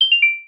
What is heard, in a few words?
disconnect